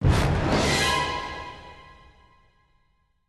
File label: Scary Stinger; little big planet playstation vita